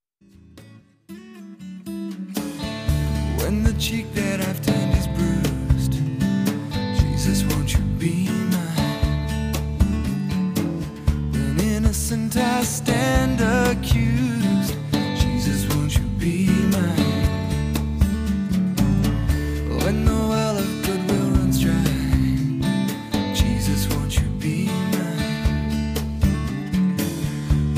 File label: Percussion Track